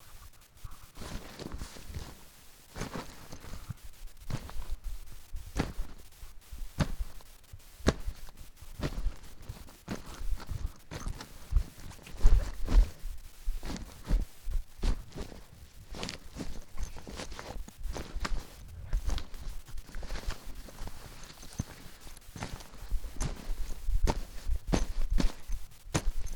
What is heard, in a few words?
Bags Rustling